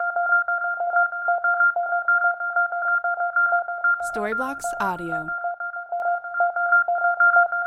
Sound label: Ambient House Arp 125BPM F